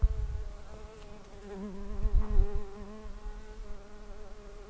Pascuorum workers, bumblebees

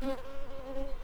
Recording, bumblebees, Sylvarum workers

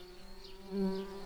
bumblebees, Hypnorum workers

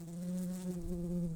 Humilis workers, bumblebees

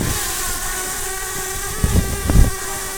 bumblebees, Pascuorum queens

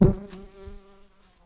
Humilis workers, bumblebees

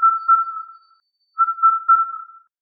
Indian cuckoo

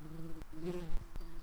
Lapidarius workers, bumblebees

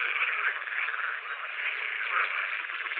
Pool-Frog, frogs

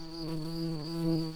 bumblebees, Lucorum queens

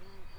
bumblebees, Hypnorum workers

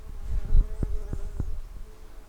Pascuorum workers, bumblebees

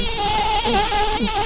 Lapidarius queens, bumblebees

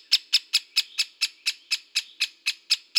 Dendrocopos major

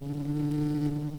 Lapidarius queens, bumblebees

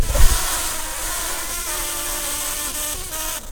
bumblebees, Pascuorum queens

